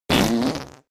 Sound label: Fart; Binding of Isaac